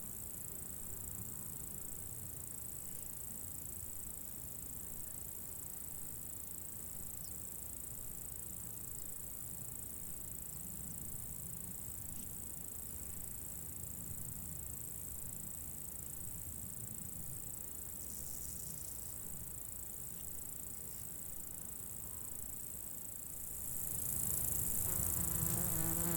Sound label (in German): mit zirpenden Insekten; ATMO